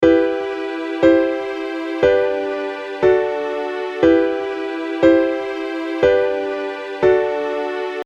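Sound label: vi IV I V chord progression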